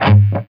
SOLDANO ROCK, Guitars

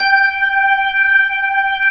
B-3, Jazz

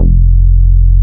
JUNO E-BS F2; Drums In Your FACE!

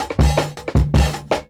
Jungle Breaks; Original Jungle Breaks

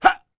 YELL, MALE HUMAN; Spectrasonics Vocal Planet

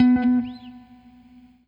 ROCK, Guitar

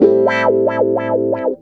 GUITAR LICK; Ueberschall; Jam Box

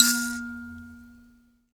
PLK Bush Mbira

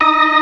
ORGAN ALL STOPS; Keith Emerson Signature Series

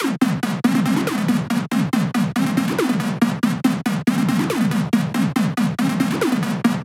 140BPM C; Vengeance - Essential Clubsounds Vol.4

